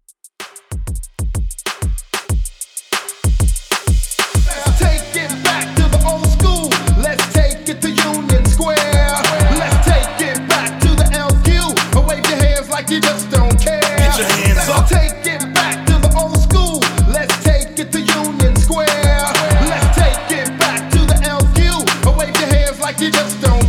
HYPE 95 BPM; REDRUM

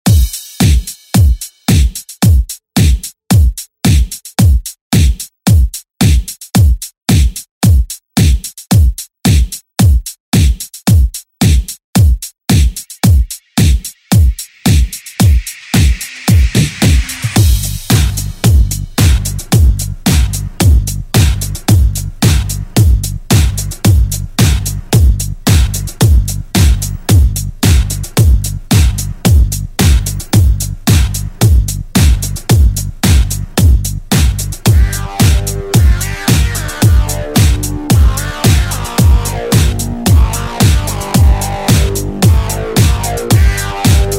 90s; DJ Mhark

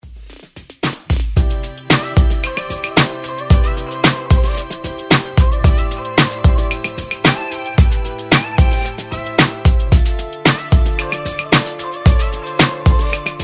Xmas Redrum

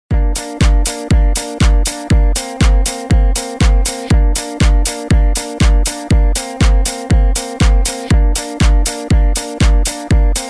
Dance Redrum

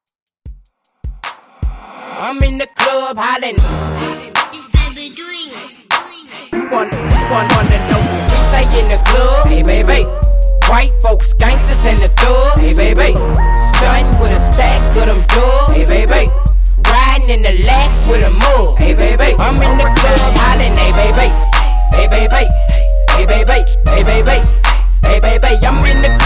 Wordplay 95 Bpm

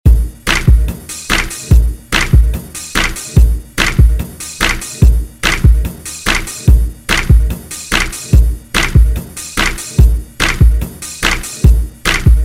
50s Ext Redrum

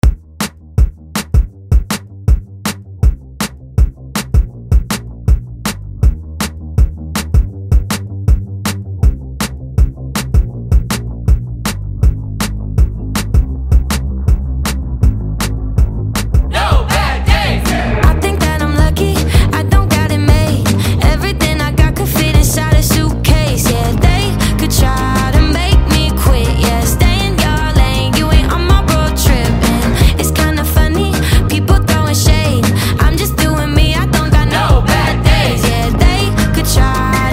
Top40 Remix

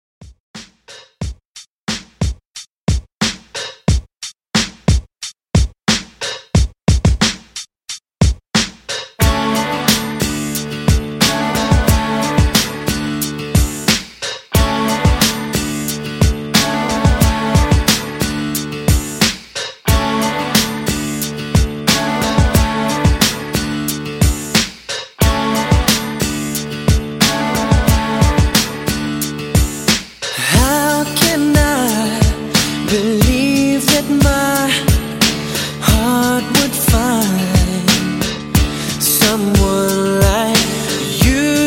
Pop Redrum 90Bpm

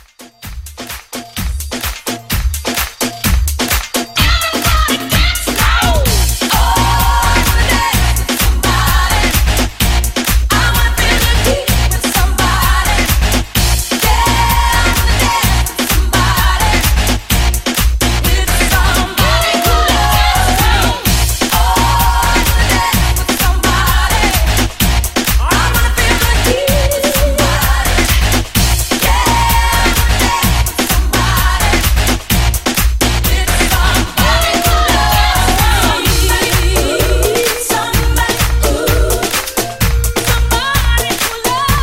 Mashup

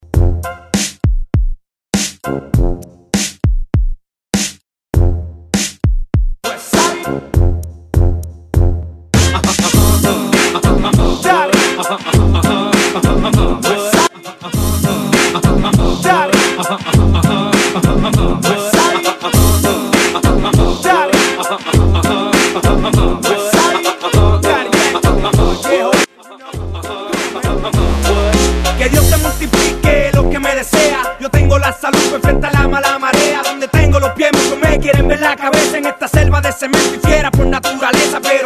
Intro Hyped Redrum 100 BPM

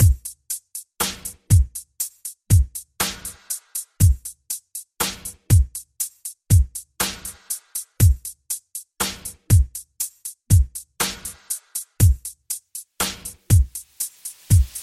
Redrum 60

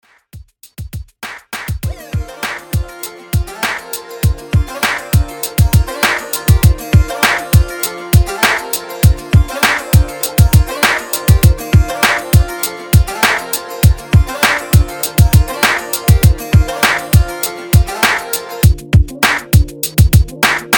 Top40s ReDrum 100 BPM

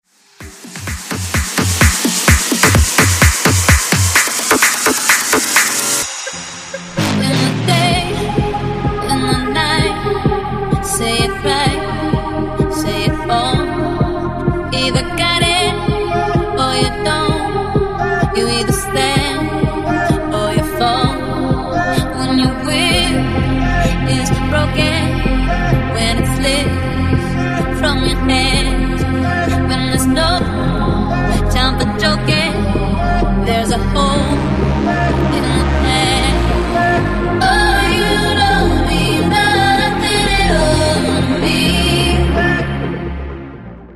2000s EDM

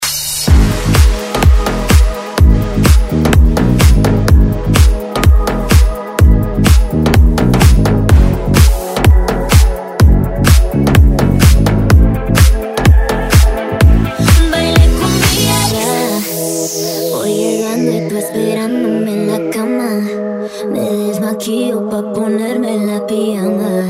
Dance Redrum

